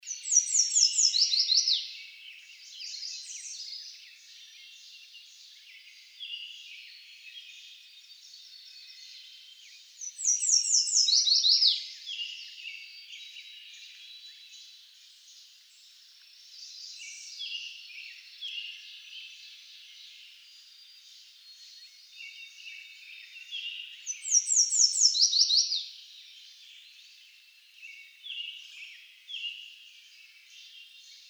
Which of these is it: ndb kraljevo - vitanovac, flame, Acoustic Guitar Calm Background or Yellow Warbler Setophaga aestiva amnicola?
Yellow Warbler Setophaga aestiva amnicola